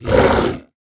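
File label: moth damage, monster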